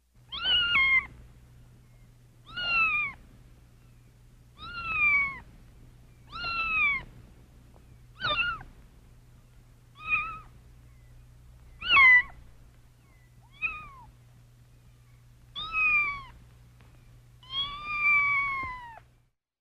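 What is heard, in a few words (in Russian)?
myaukanie kotenka, kitten meowing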